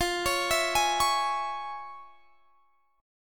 F Minor Major 7th Sharp 5th, chord; FmM7#5; arpeggiated; x,20,23,21,21,21

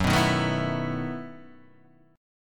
E Major 7th Suspended 2nd Suspended 4th Gb

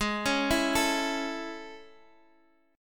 A Major Ab; arpeggiated

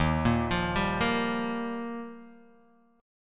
Eb Major Add 9th Eb down Guitar DADGAD

